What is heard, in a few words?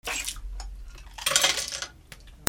KITCHEN